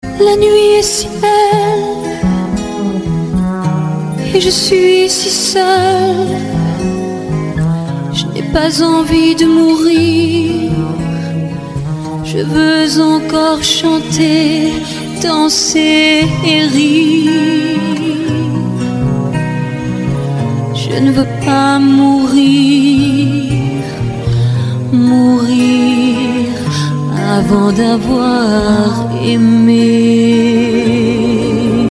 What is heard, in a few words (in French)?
chant